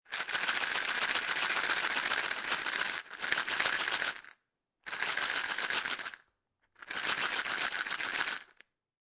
Pill Bottle Large Shaking Bottle, Foley, Pill Bottle, Large Plastic Bottle, Shaking Bottle With Vitamin Pills Inside